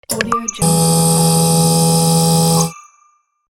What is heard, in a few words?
work neon lamp